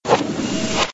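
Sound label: door close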